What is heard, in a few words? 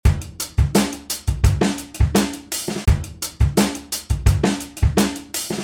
break; Arcade